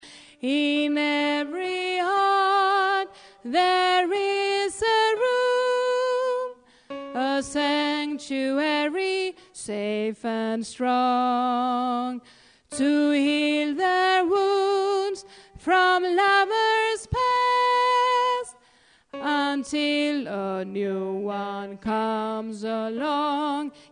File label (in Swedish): bas